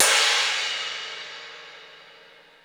CYMB, CYMBALS; Crypton - DrumBase5000

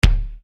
Bass Dr, EuroDance Bdrums; Best Service; Ethnic Journey Sound Cube; EuroDance Essentials

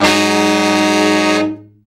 BRA SECT; BRA SECTIONS

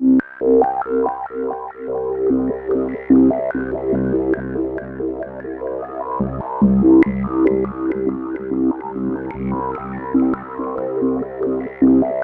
Roland JV 2080 LFO C1; MISSION LFO

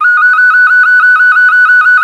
OCARINA

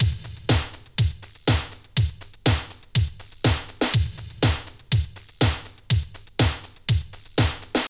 Drum Loops

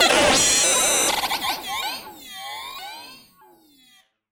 Malfunction Synth, malfunctioning synth samples

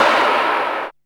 SUN BURST

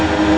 door; Amiga Soundtracker Sample Packs

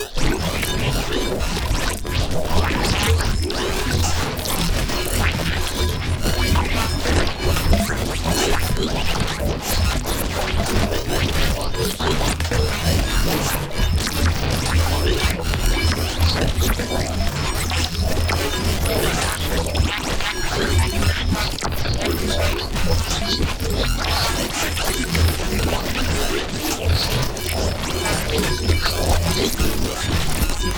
Bass Granulize E1

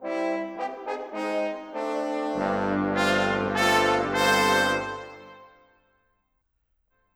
Brass; Sonic Foundry (Sony Creative Software); Orchestral VOL-2; Modern 14

